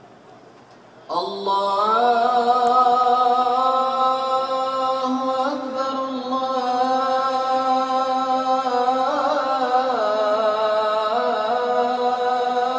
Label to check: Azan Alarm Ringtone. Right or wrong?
Right